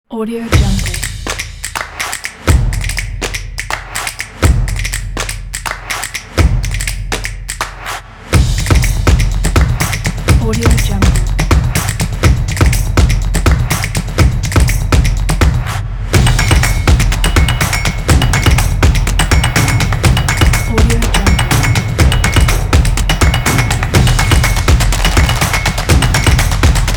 drumming

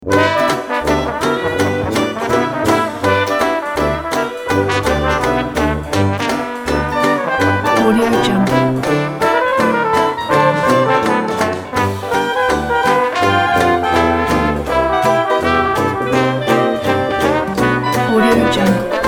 dixieland new orleans jazz

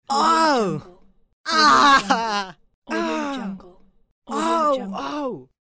teenage boy painful screams pack

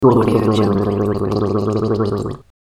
mouthwash gargling in bathroom

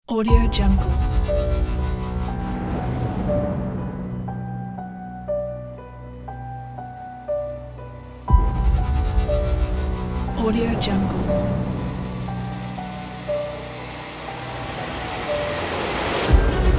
warning epic movie trailer

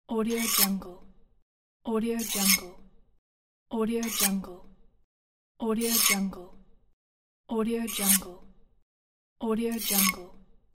knife sharpening about whetstone

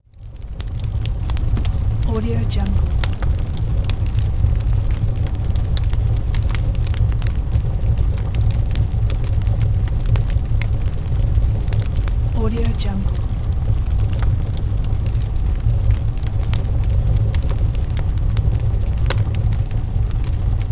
fire

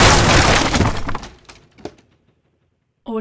rupture wood pallet impact heavy; soundmorph

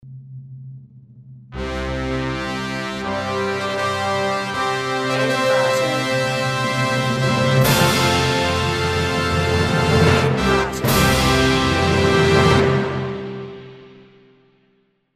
awarding; soundroll music

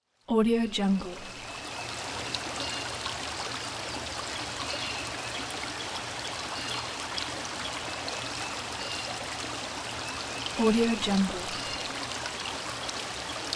forest river

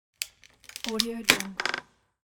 wood splintering

